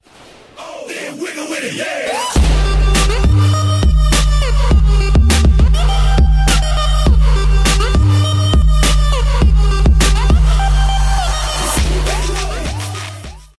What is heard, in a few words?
Trap Wild